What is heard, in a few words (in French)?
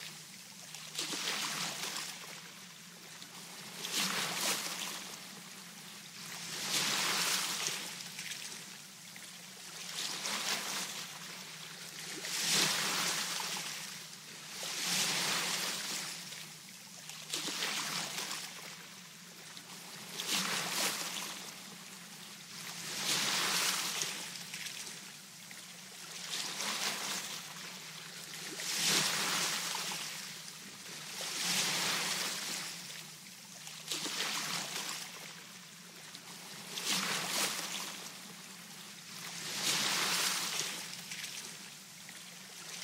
Son vague